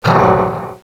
hugh, Echo (inside caves, underground ect.); DS; ML BOWSERS INSIDE

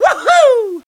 wahoo; DS; ML BOWSERSINSIDE; Mario

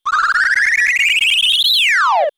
powerup; geno; SNES; SMRPG

